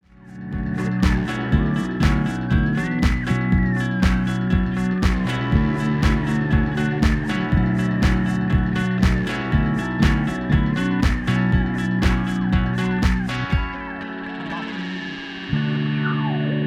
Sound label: relaxing music